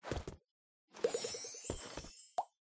emote blow kiss foley; fortnite emotes; Athena

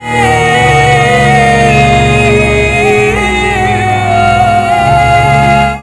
lose; avatar

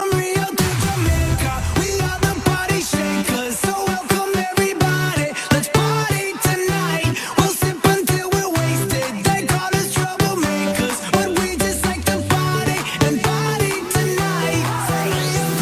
Shaker

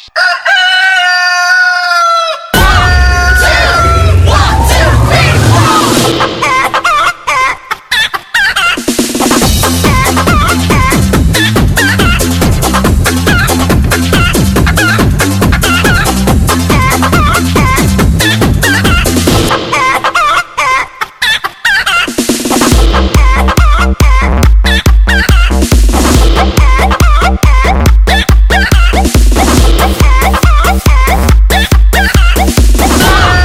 music

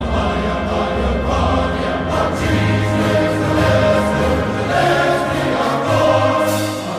orchestra

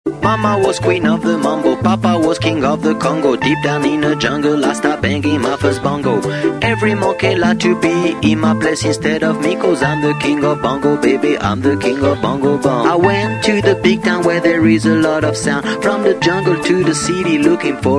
bongo

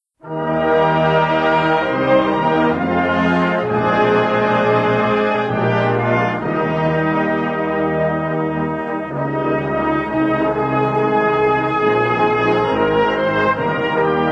anthem cz

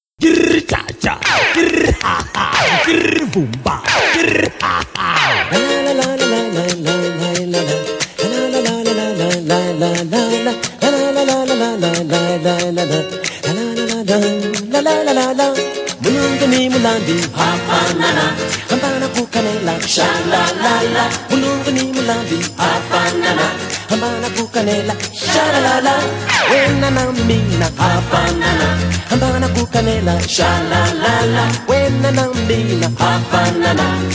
music

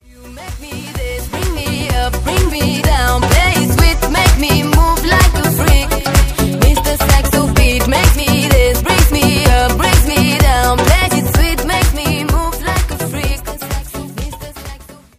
sax beat

